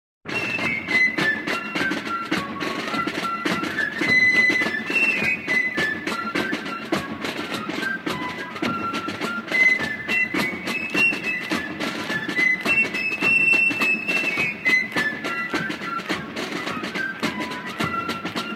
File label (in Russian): baraban i flejta